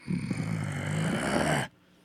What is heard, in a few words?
zombie groan